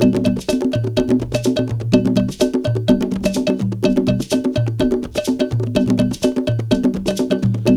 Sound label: DRUM CAB BELL; Zero G - Ethnic